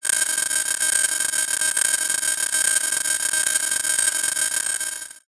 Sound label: science fiction transmision data short burst glitchy